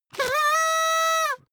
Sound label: paper hoot loud